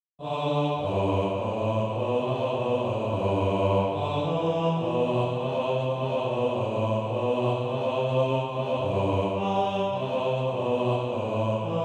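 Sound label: bass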